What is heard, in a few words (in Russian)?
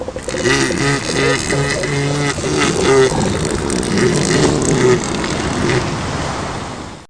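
тюлень издает звуки в привычной среде обитания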